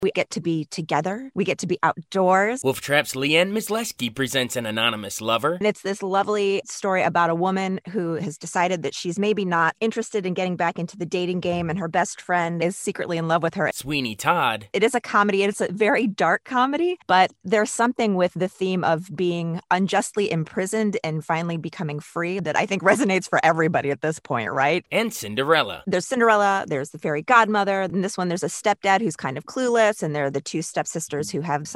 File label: opera; WOLF TRAP